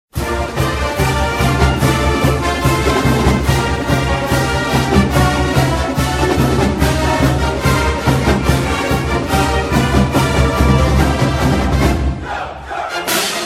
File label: Fight Song Marching Band; Maryland Fight Song; University of Maryland Marching Band